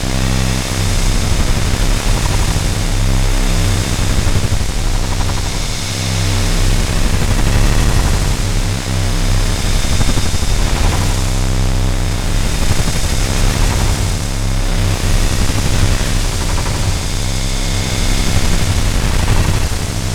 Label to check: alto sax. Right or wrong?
Wrong